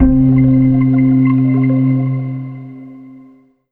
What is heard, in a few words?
Korg M3R Nocturnal C3